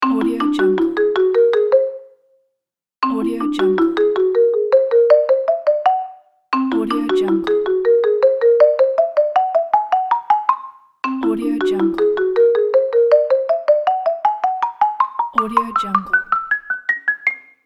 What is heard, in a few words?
cartoon up the stairs